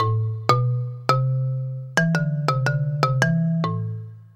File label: Marimba Bass Melody